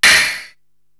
SD Indus Noise, Korg TR-Rack drum machine

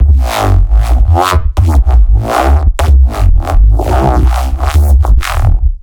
Koan, bass

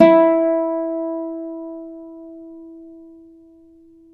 Celtic Harp, Plucked Strings

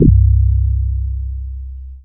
DX7 bass, synths

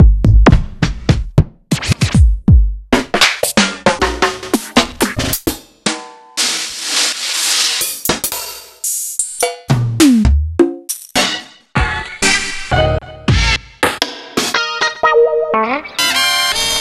Kit; proteusvx

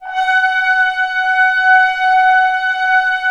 ORC F#4 PP, Orchestra; Sound On Sound - Peter Siedleczek's Orchestra

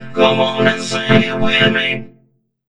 Funky Vocals; USB Soundscan vol.18; AKAI

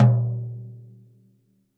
floor tom - snares off; JazzFunkKit

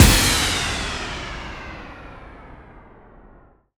Impact

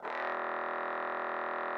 trombone E1; Xsample VOL-3

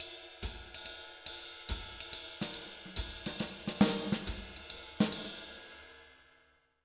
Jazz Latin Drumloops; USB Soundscan vol.08; AKAI

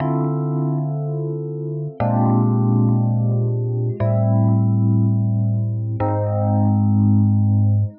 120 Rhodes Chords D, Keys, Nu Jazz House; PowerFX

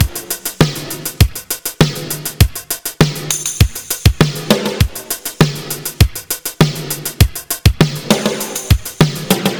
100 Beat; Skyfire; Zero-G - Future Wave

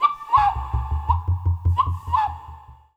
WildFX, African; Quazibeat; African Secret; SFX

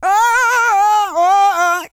SING, Vocal Planet R&B & Dance; ILIO

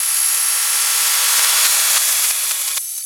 FX Loop, Drums & Drones

